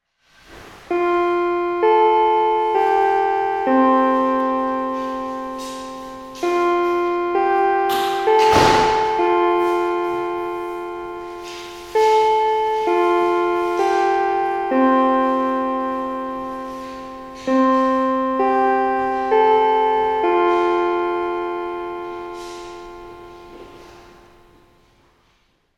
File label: Chime (Class)